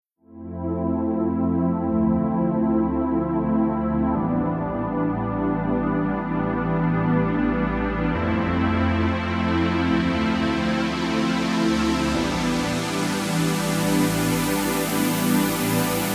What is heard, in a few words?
Synth Pad Flt Sweep